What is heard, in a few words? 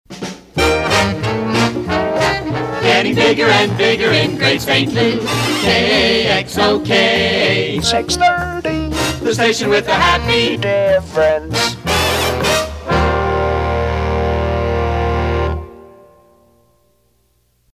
Happy Diff Sig Workhorse; PAMS; KXOK